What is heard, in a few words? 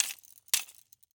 Roller skates, old fashioned, all metal, wooden wheels, clash, strike, clatter, ping, double, spin; Eiravaein Works; Vaeyan IV